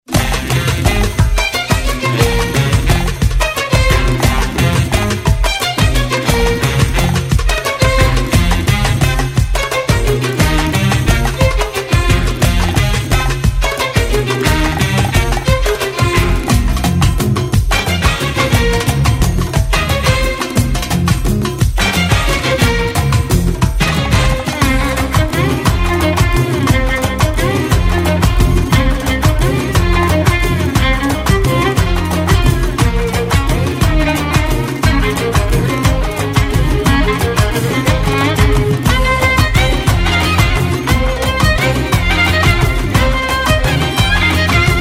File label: Violon